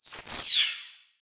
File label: garbage in medium; tetrio